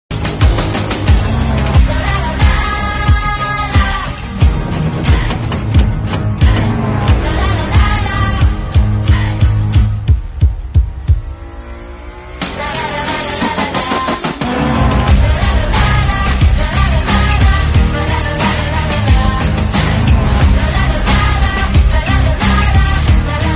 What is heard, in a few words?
Mass Bgm Theme; Pathan; Hindi